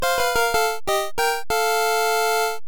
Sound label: level up